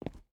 footstep rubber boots concrete walk; Rust

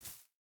footstep hide grass jump start; Rust

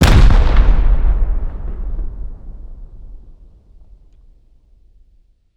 rocket explosion; Rust

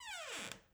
door wood close start; Rust

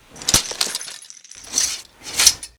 Inf Weapons Foley; Red Orchestra Ostfront 41-45

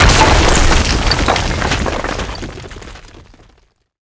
rock explosion layer; Explosions; Call of Duty Modern Warfare

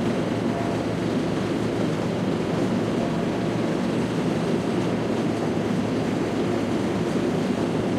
tank engine int rpm; War Thunder

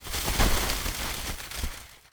objects, World of Tanks

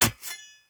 machete strike muted; Rust

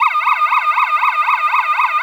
car alarm loop

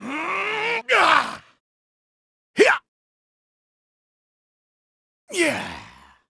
terracotta stab

